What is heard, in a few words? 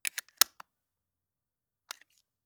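Camera, lens cap on off, click, Point and Shoot FUJI Cam; MKH8040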